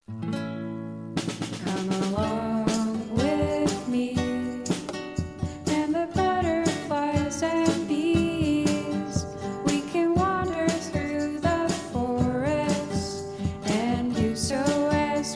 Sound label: sweet soft